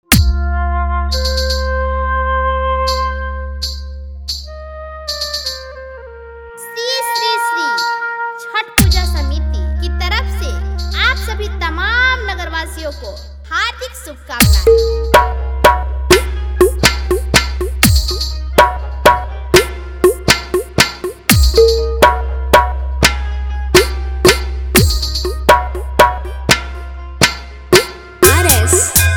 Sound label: High Power Vibration Mix